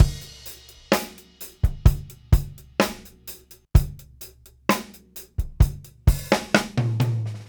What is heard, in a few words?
Beat Loops; Zero-G; Monster Beats